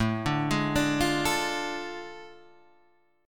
A Major 7th Suspended 4th Sharp 5th, Guitar, Half Step Down